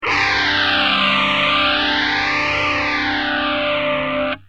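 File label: guitar, phase 100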